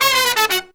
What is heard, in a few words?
Horns, 120BPM